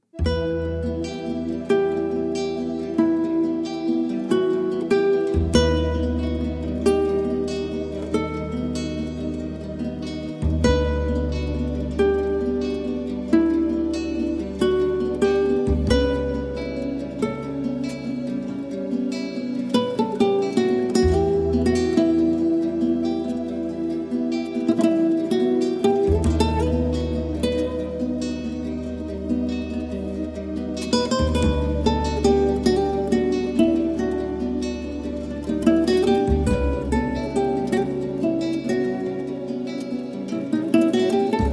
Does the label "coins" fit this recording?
No